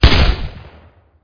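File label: Assault Rifle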